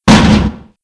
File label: Metal Kick open; Playable Tutorial Level